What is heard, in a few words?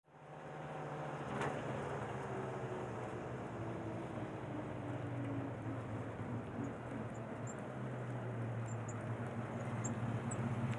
1947 Dodge Accelerat, dodge pick up